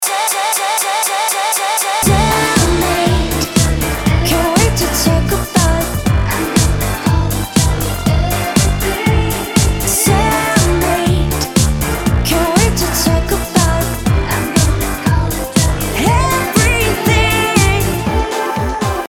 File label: RINGTONE